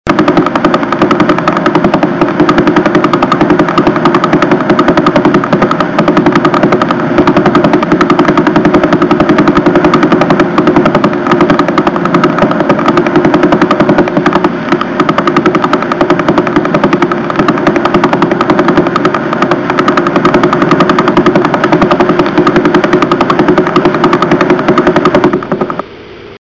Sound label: vela, pulsar; astronomy